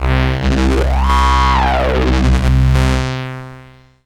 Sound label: dist sweep, Synth